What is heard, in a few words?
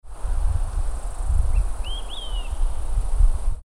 Olive-sided Flycatcher